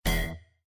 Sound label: Critical Stop